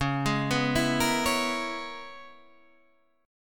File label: Db Minor 13th Db; Dbm13 arpeggiated; JGuitar